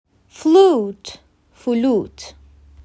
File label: Flute